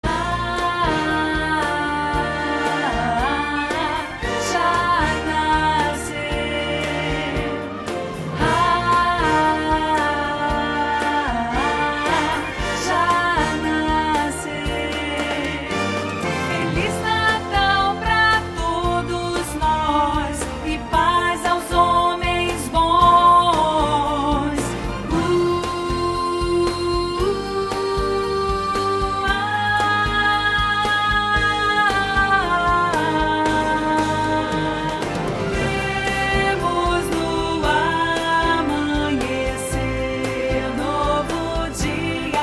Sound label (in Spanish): Contraltos